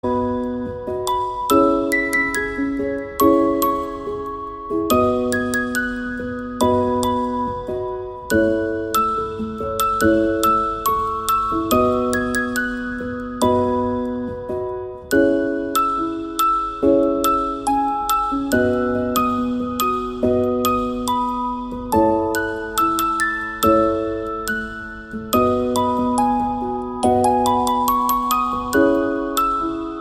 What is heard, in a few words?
Peaceful Feeling, ringtone; MIUI12